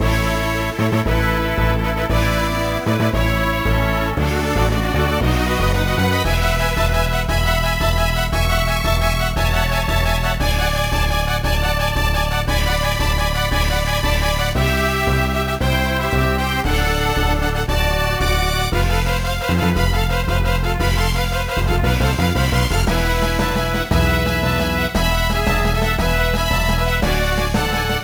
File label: Victory Ceremony; Mario Golf Advance Tour